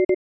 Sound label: Beep A